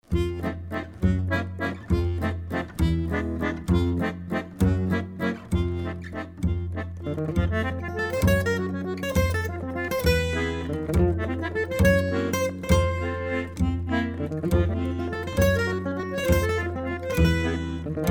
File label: waltz